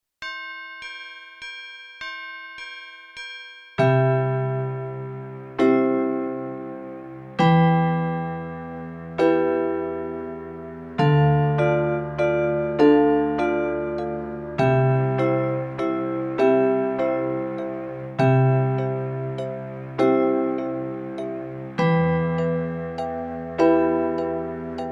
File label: little church bells